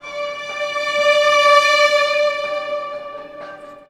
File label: TAR STRG -R, Roots Disco; USB Soundscan vol.32; AKAI